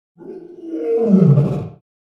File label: Jungle Roars; gnarled forest